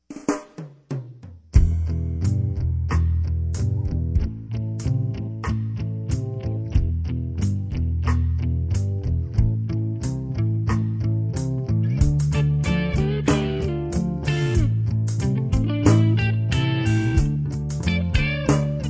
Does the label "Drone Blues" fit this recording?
Yes